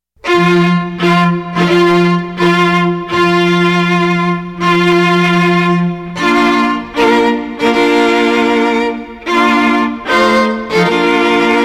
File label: bridal chorus